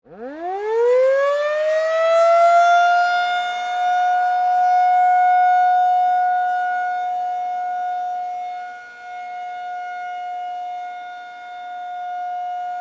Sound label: Tornado Siren, Emergency, Tornado, Siren; Hospital Sound Effects; SoundEffects-BlastWave